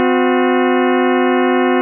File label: bell sounds tritone